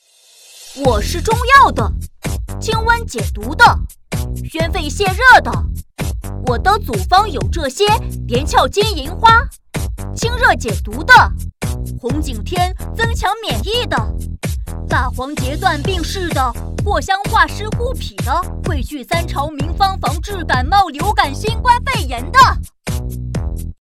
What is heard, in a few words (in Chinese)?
男童唱歌